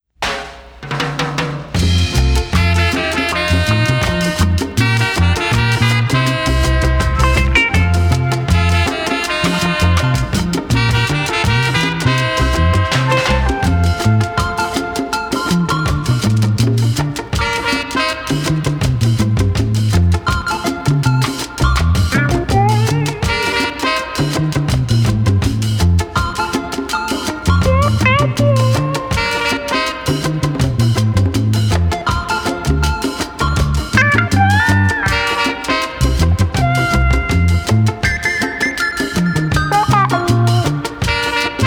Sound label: african reggae